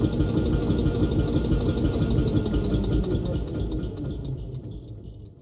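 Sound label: Compressor End, Train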